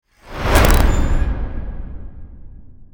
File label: lock out ramp